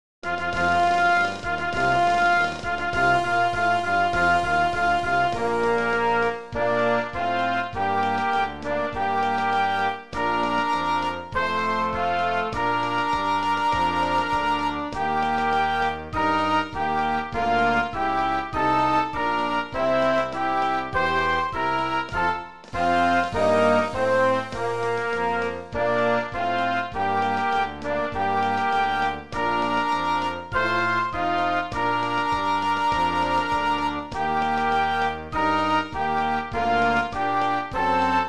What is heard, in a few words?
Anchors Aweigh playback